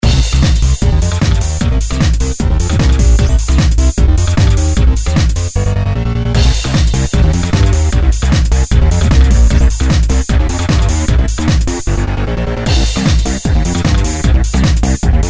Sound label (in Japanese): ターボ BGM